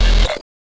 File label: Glitch Sound